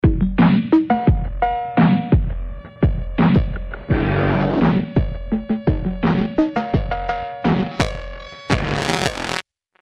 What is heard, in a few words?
beat to distortion